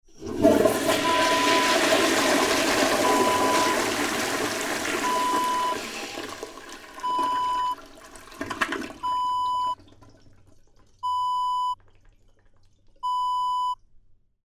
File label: toilet flush